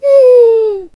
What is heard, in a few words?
ladybug oof, creatures